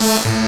techno syn A, synth notes; Techno Trance Essentials

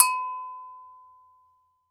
GANKOKWE, Gankokwe Bells, Percussion; Drums&Percussion; E-MU Producer Series Vol. 7 – Old World Instruments

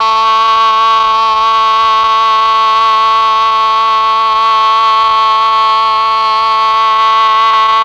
OBOE; ILIO; Propeller Island Legendary M400

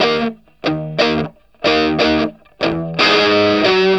Vintage Blues Guitar, 60, EF; USB Soundscan vol.22; AKAI

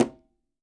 Quinto, Percussion; Sampleheads; NYC Percussionworks

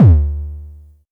High Kicks D2; LIMAT Div Dept; Drums

